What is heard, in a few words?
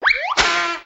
gopher stomp; Crash Bang Boom